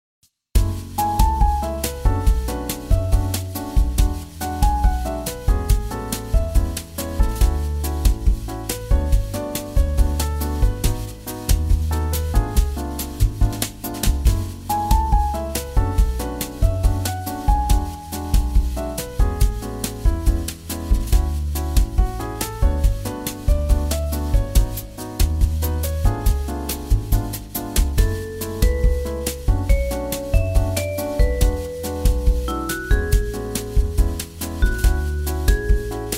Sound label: Bossanova